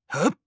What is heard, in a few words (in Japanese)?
攻撃